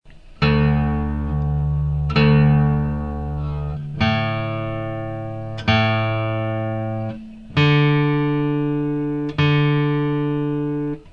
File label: tuning D A D F sharp A D